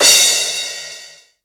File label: Small Reverb Crash Sound Sample D# Key, Royality free drum crash sound